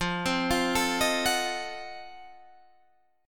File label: F 7th Flat 9th, Guitar Open D